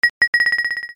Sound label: FALLING